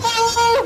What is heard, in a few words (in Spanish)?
elfo aaaaaaiii